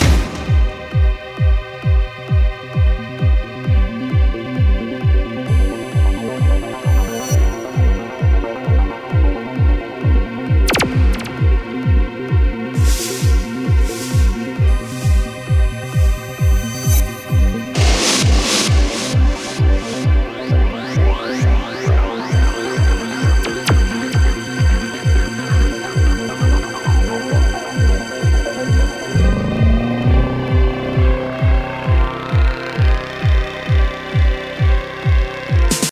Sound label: Boss Music; Drake of the 99 Dragons; xbox